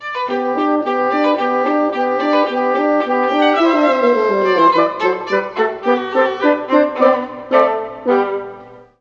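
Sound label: horn viola oboe; Juilliard Music Adventure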